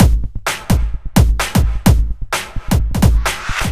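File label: bass drum snare, Drum Loops 129bpm; Zero-G; Soundclash Nu Dance Electro; Acidized Wavs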